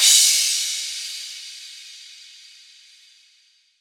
Crash Cymbal, House; GhostHack; Ghosthack Advent Calendar; House Kit

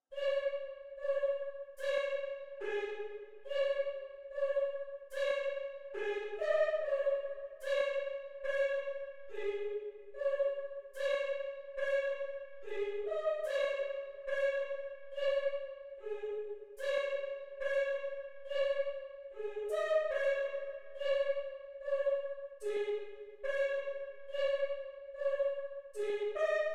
144 BPM C# Min Choir; Loop Stems; Cymatics; Warning; 2022 Melody Collection; Drill

